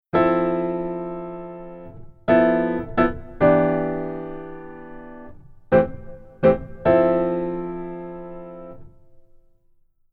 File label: Keyboard Rhythm Pf Only